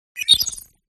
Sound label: Open; PMSFX